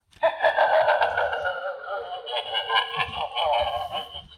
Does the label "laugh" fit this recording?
Yes